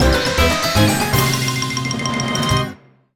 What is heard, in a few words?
Fanfare; "Get The Lady's Treasure" fanfare